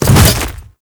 Hammer Boss Step Ice, Footsteps

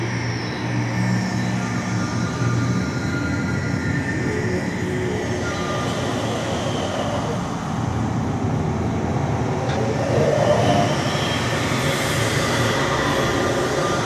Ambient Loop; Tenno Hub Apostasy

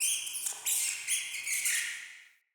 Cave Mine Rat

